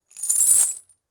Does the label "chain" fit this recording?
Yes